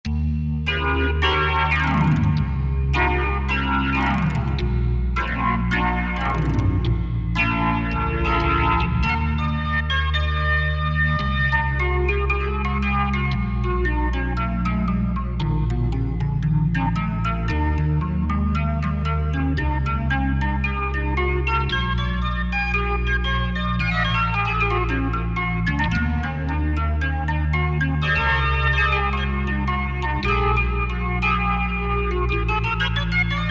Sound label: Big Perc Organ; Korg TR-Rack